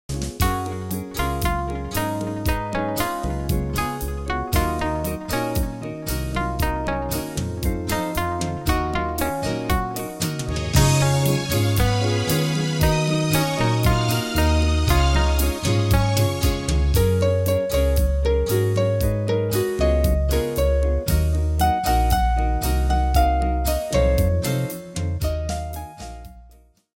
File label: instrumental